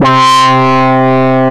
Bass Synth Lead

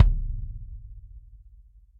KICK Deep Fat open plastic

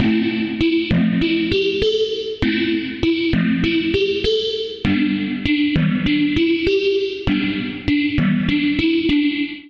Warp Bell A Minor 99 bpm; Accompaniment; Tonal; MIDI Clips; Drive and Glow; Ableton; Factory Packs

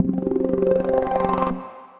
Growler, Ambient & Evolving; Da Growler

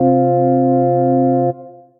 Analog Drawbar, Synth Keys

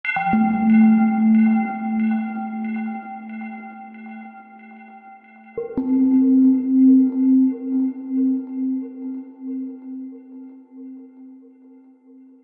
Discordant Notes Gmin 135 bpm; MIDI Clips; Tonal; Atmospheric; Ableton; Factory Packs; Mood Reel

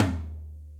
Rack Tom; Drums; Drum Hits; Ableton; Drumdrops Recording Hybrid Kit

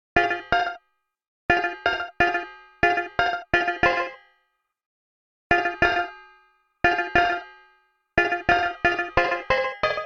Melody F Minor 90bpm; MIDI Clips; Melodic; Plucky Ducky; Ableton; Factory Packs; Chop and Swing

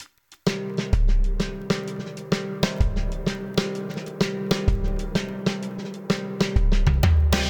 Dubbed Snare Old School 128bpm; Ableton; Factory Packs; Session Drums Studio

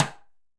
Timbales B L Stick; Latin Percussion; Drum Hits; Ableton; Factory Packs

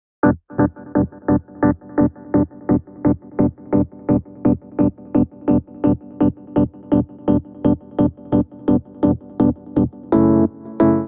Muddy Whirl Piano E Minor 85 bpm; MIDI Clips; Tonal; Accompaniment; Ableton; Factory Packs; Chop and Swing

